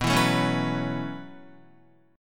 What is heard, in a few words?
B 9th Suspended 4th B down Guitar; strummed